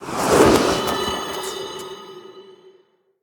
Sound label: Robes of Protection Play